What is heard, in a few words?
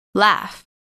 laugh; environment education